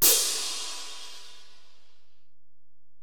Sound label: Ride, Alesis DM-PRO, Drum Machines